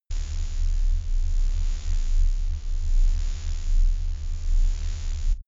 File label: space alien machinery steady calm